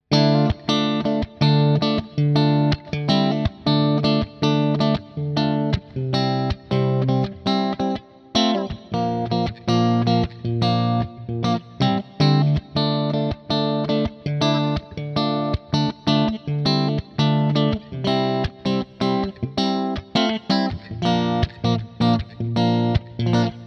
Framus Diablo Pro Bridge and Middle PU split clean